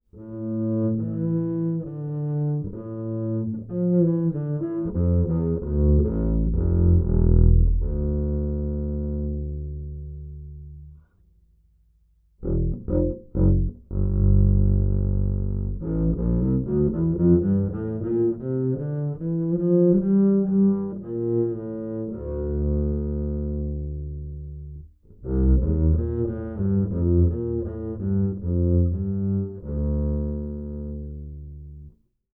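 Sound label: acoustic bass Bowed direct input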